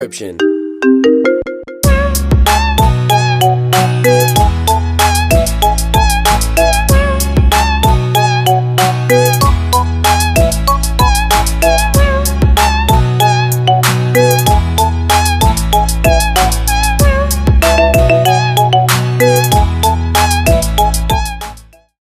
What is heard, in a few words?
iphone ringtone marimba remix ringtone